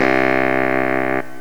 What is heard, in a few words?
baritone sax, reed